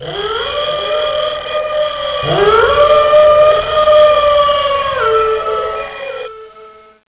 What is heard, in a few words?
wolfsong